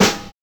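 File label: creep snare; Drums In Your FACE!; HIP-HOP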